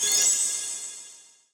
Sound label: decision, button